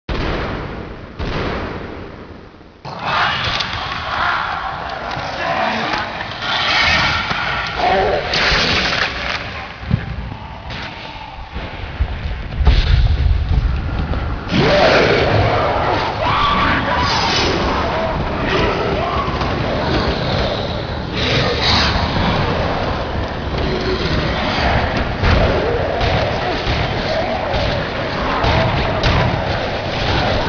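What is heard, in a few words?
zombies ambience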